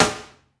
treble heavy snare drum E key, Royality free snare one shot tuned to the E note